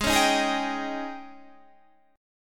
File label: G sharp Major 7th Suspended 4th, Guitar, Standard; G#M7sus4 strummed; JGuitar